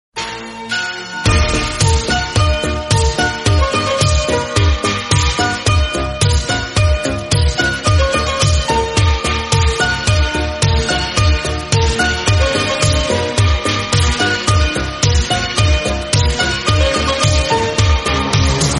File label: old 80s sound